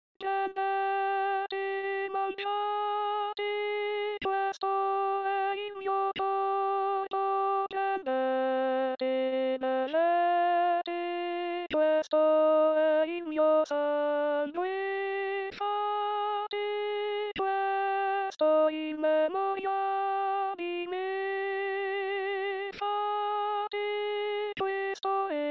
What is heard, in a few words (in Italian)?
Prendete e Mangiate Coro